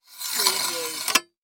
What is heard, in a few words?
Sword Sheath Insert